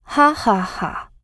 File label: laugh; Eternal Return; Katja; ENG